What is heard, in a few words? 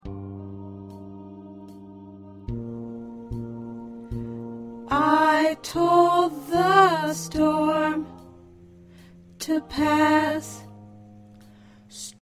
Alto, choir